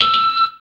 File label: FX Dance